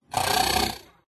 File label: negative mechanical growl hybrid mobile collect special power up buff